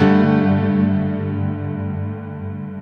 Ambient Chord, Keyboards

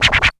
FINGER, Funky Elements FX; Zero-G

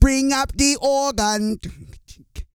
REGGAE, Vocal Planet World Voices

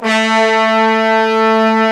BRS, Fat Pop Sect; Roland L-CD702; Fat Section

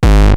Roland TR-909 BD; BD Selection

